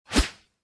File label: claw